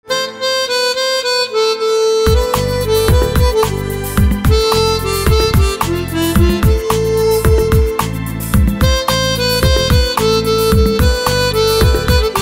Harmonica

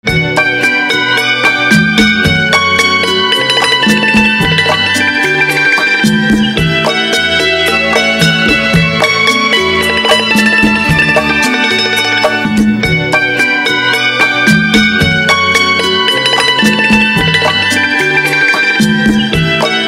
Xylophone

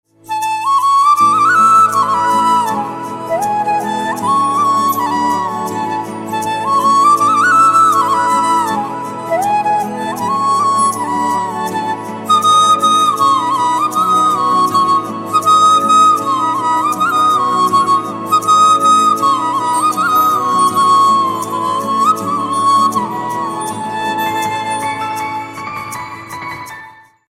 Flute

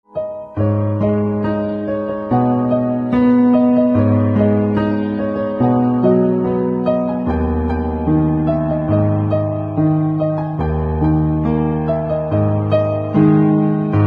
Piano

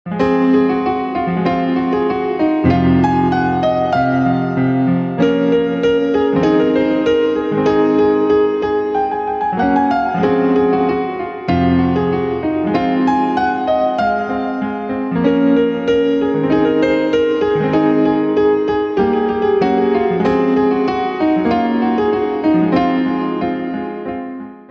Piano

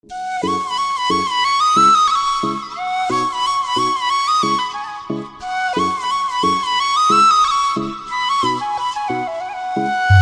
Flute, instrumental ringtone

